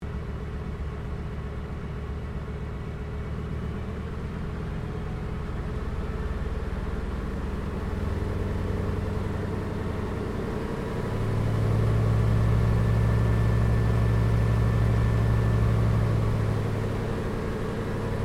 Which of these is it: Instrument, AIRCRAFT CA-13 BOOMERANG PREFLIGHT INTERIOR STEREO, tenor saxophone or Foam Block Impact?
AIRCRAFT CA-13 BOOMERANG PREFLIGHT INTERIOR STEREO